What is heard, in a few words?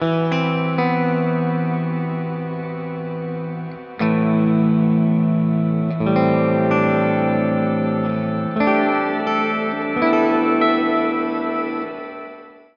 sfx angel shimmer; angelverb